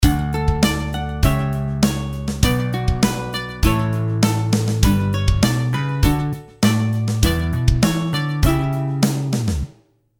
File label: delay